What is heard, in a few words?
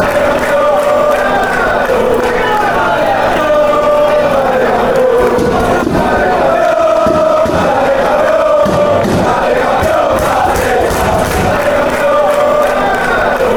come on champ, chants